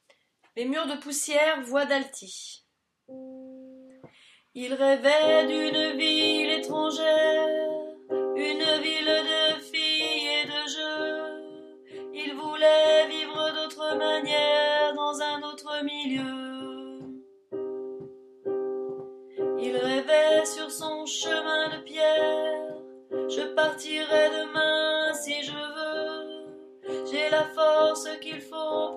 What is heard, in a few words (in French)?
les murs Alti; pupitre alti; enregistrements de travail